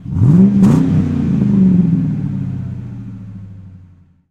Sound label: 99 Mustang Rev, Automobiles